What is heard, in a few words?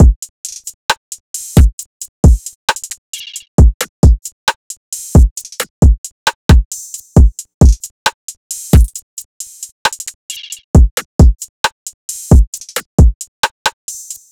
Drum Loop 134 BPM; trap; Drum Loops; Solitude Drum Loop; Cymatics; Trap Starter Pack